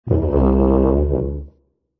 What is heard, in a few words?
TUBA COMEDY